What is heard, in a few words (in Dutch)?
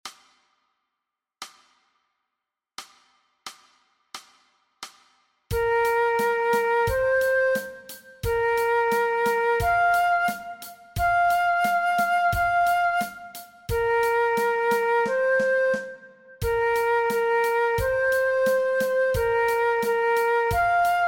MEESPEELTRACK Fluit alternate; Dwarsfluit aangepast; Aangepaste oefening NT 4.2 Dwarsfluit vanaf repetitiecijfer 1; Nij Talint Orkest